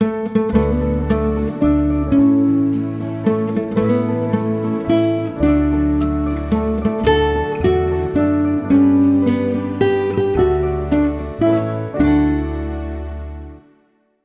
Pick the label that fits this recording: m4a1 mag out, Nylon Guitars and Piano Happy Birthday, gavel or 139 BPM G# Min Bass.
Nylon Guitars and Piano Happy Birthday